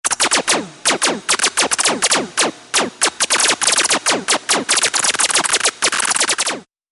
Laser Alert Tone

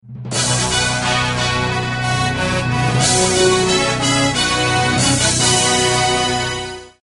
Hot News Alert Tone

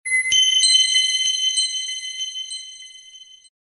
flourish high magical twinkle; fantasy

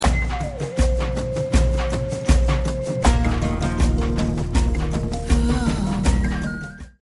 rhythmic and inspiring

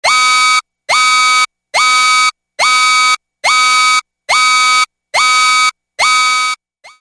General Warning System

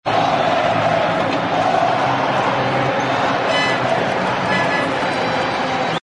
soccer crowd chanting

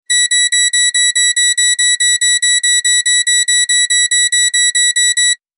Electronic Alarm

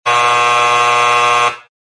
alarms buzzer game show

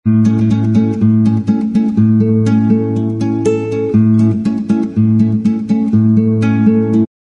classical guitar accent spanish

